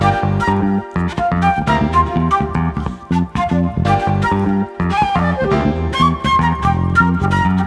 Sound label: disco backing; Best Service ProSamples vol.48 - Disco Fever; AKAI